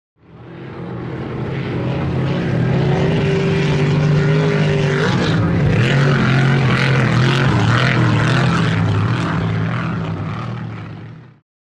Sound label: Power Boat By Fast, off shore power boat